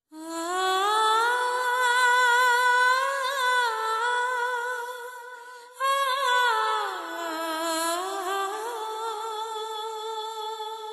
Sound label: Run Bgm